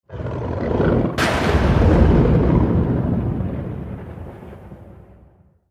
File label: Dracula thunder strike vampire